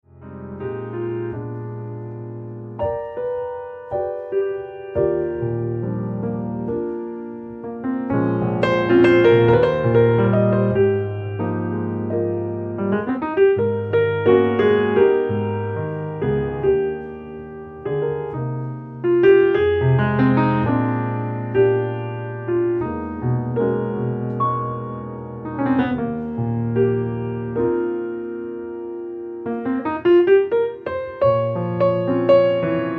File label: solo piano